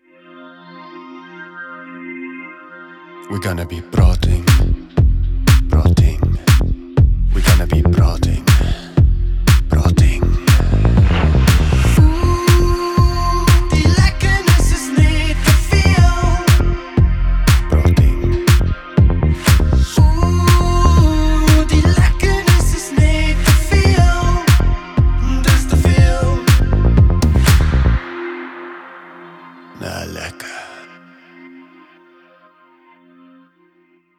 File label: Theme Ringtone; KAKGHROOT RINGTONES